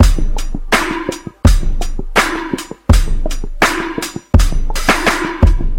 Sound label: Loop